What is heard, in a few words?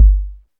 verby kickdrum g key; kick drum samples